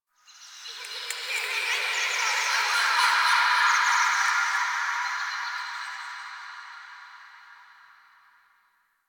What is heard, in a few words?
Park Memory Echoes; Warframe; Quests; TheNewWar; Ambience; Zariman